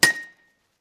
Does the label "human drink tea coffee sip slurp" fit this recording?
No